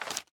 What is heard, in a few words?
Footstep Paper-Sheets